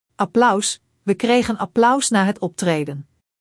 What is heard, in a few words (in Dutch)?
applaus